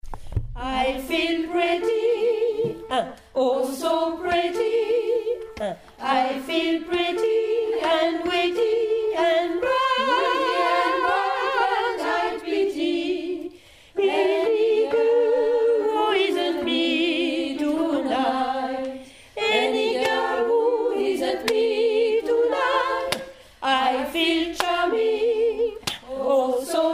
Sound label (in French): tutti